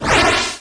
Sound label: Flame